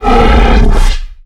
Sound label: giant agroed growl; mutants; STALKER; customnpcs